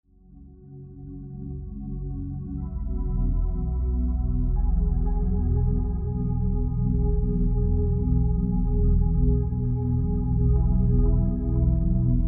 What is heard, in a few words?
isochronic binaural delta background track; hypnosis programs